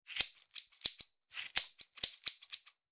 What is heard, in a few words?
Cutting hair scissors snapping